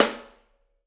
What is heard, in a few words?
Piccolo, drum